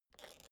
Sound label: suitcase latch lock spring creak